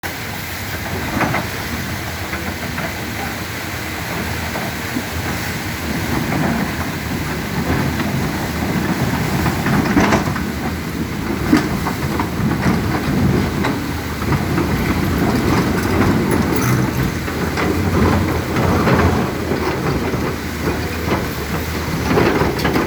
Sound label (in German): Bagger fährt die Gleite hoch